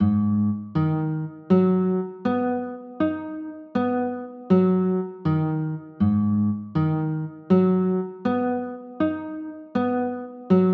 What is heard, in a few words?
a flat m7, chords